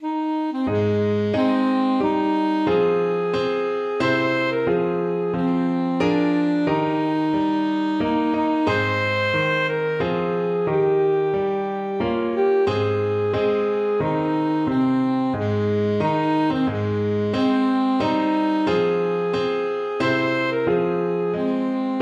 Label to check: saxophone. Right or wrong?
Right